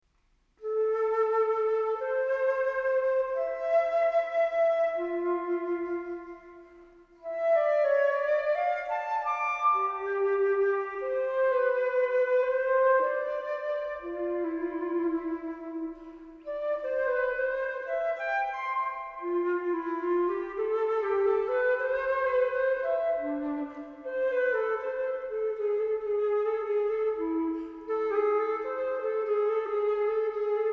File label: Crystal Flute Ringtone; Teleman Fantasia 2